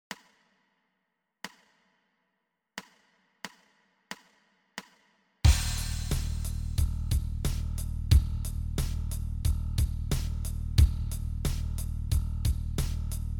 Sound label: bass startup open e